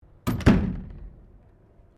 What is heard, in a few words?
CABINET DOOR CLOSE, Sounds onboard HMAS Castlemaine; Boats; Vehicles